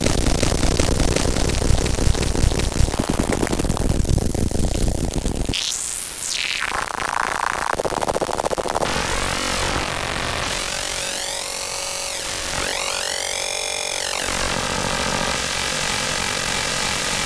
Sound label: gravy noise